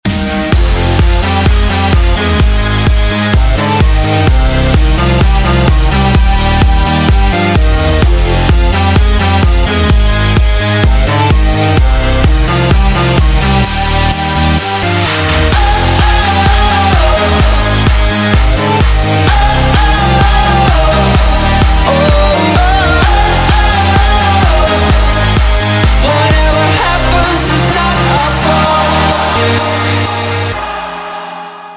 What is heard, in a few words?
remix